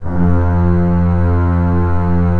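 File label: bass F1; Digital Sound Factory Virtuoso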